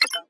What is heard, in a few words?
Computer Start; Santuario